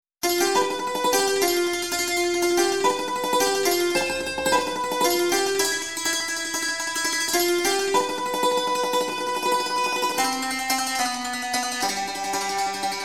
Dulcimer Trem Verb

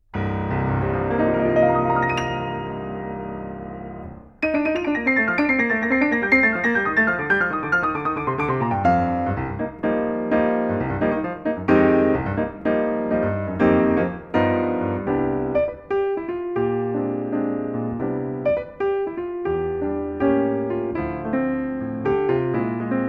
Piano; R09HR; EDI R09HR

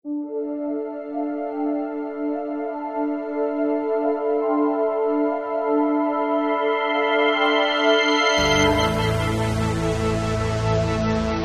JP8

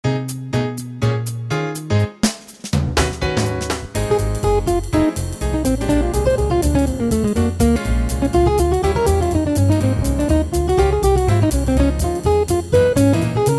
j quartet

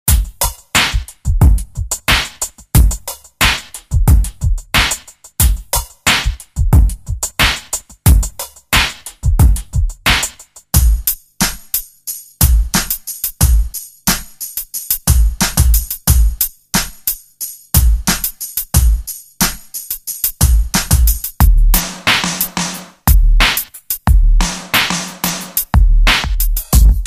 Hip Hop; Street Kits

